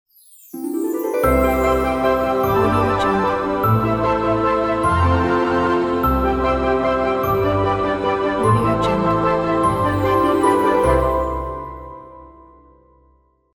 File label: fairy tale waltz logo